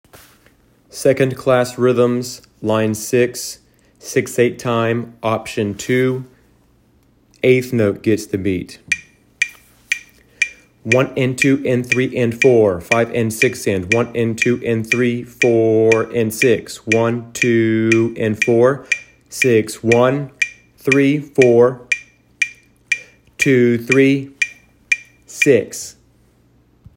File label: rhythms eighth note